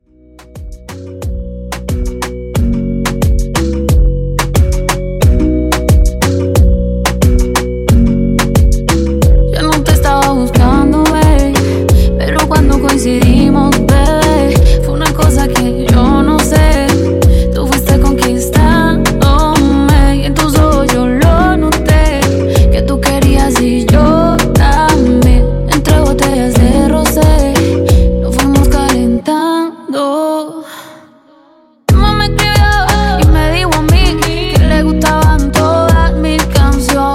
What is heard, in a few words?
In Outro 90 bpm; djyan